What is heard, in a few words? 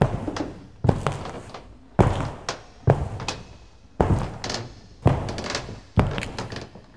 Haunter noise step